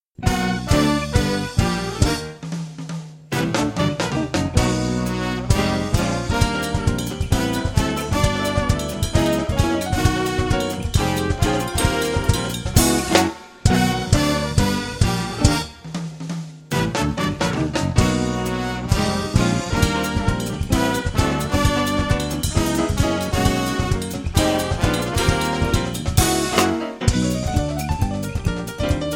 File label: jazz keyboard